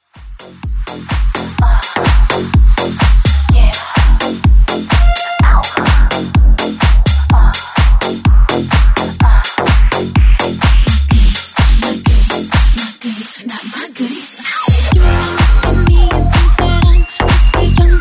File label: Mixshow DIRTY 126BPM SNIPPET